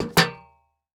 Foley Footstep Golf Cleats Single Step On Metal Platform High; Foley Footsteps